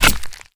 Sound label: Swamp Jumper Hit By Axe; We Are the Dwarves